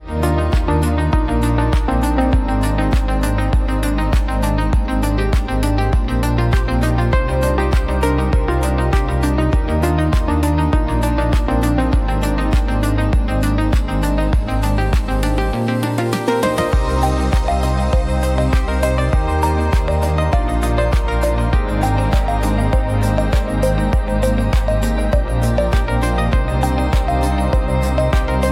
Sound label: slow flow, industrial zone